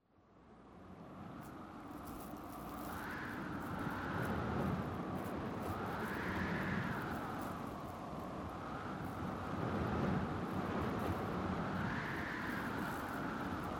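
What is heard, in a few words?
blizzard sweeping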